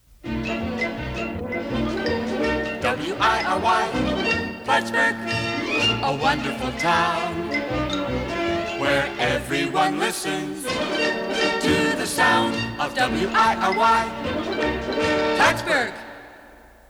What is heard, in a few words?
wiry jingle